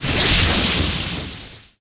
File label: Frost Nova